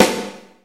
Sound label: Korg Snare Drum